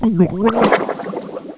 DROWN

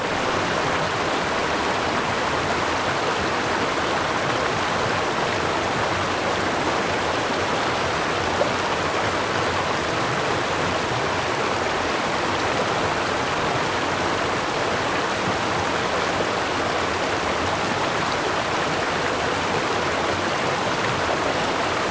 river

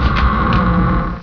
door

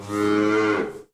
bump; bigmack

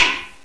bump; qbot

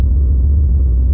rumble

